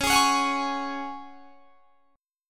C sharp Suspended 4th C sharp down, chord; strummed

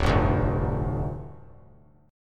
D Minor Double Flat 5th, down; Dmbb5; strummed; JGuitar

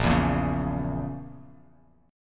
A sharp Diminished; strummed

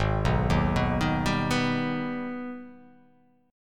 G sharp Minor Major 7th G sharp down

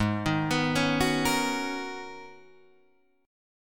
G sharp Minor 11th G sharp Guitar DADGAD, chord

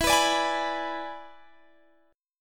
E 7th E down Guitar Drop D

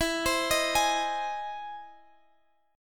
E Augmented Major 7th E down Guitar Standard; E+M7; arpeggiated; capo=3

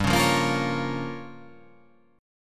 G Minor 7th Flat 5th

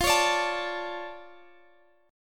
F Augmented 6th F, chord

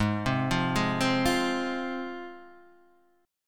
C Suspended 4th Sharp 5th G sharp

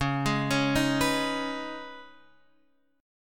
C sharp 7th Suspended 2nd, Guitar Half Step Down; C#7sus2

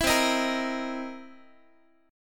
C 7th Sharp 9th, Guitar; C7#9 strummed; 19,20,18,20,x,x

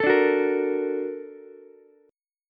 Bb Augmented Major 7th Bb down Ukulele; Bb+M7 strummed; JGuitar

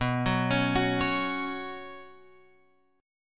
B Suspended 2nd B Guitar Open F; arpeggiated; JGuitar

